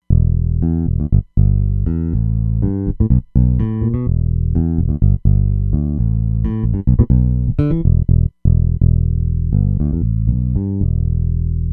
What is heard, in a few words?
Finger Bs, Roland Fantom XR